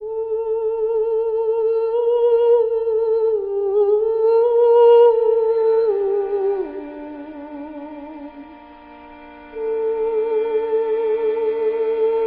voice and strings full aria; Loops; Sad

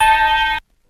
clang, Bells; Misc Percussion

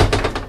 beam, Percussion Ticks; Misc Percussion

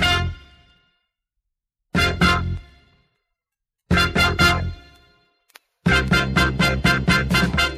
horns building fanfare, loops; Phonky

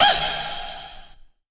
woo

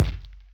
Kicks Soft

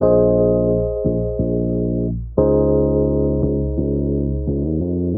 wobbly, Electronic Loops

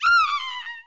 scream female whine; ooh ahh

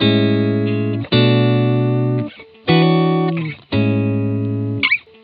guitar, loops; Phonky

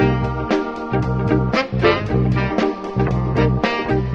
horns, phonky loops

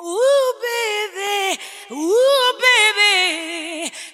ooh baby ooh baby SUNG, Voice; ooh ahh

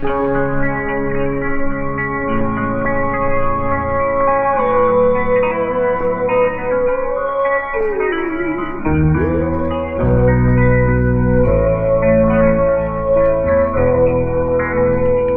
guitar, Sad

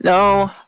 "no" SUNG; Voice; expletives

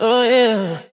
"oh yeah" SUNG

